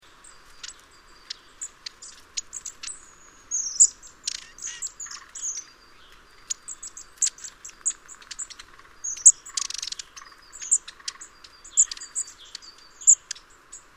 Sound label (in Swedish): Stjärtmes